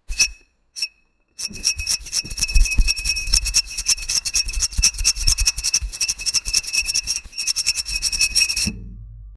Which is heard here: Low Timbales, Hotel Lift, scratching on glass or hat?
scratching on glass